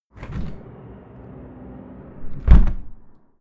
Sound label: window open flight flyby close; objekte; fenster